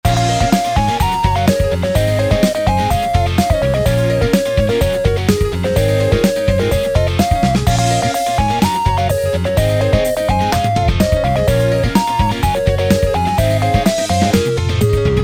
Drums sabi half

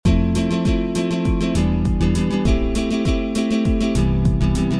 Strum

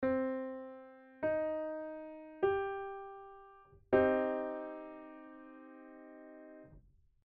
c min cho

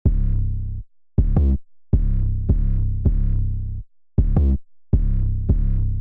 Bass One

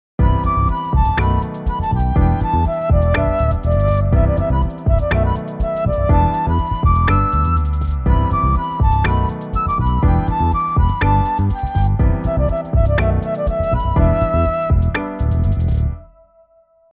Key Change; キーを変更後; Logic Pro 11; Session Player; キー変更; トランスポーズ

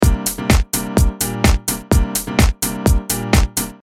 piano; studio one

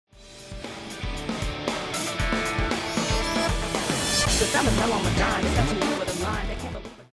reverse arrange

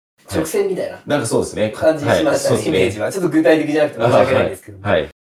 high level before

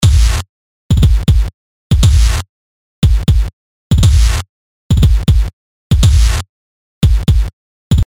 lofi

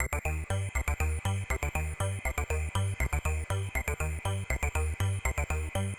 Arp; Keystage

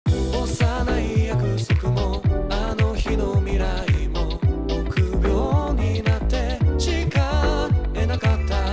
Kick Drum Dry

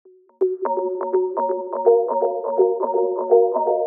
synth Delay

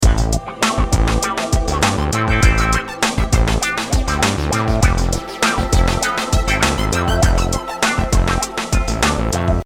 pan; korg gadget

